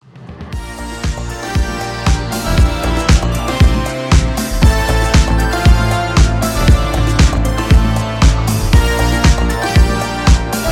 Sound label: Instrumental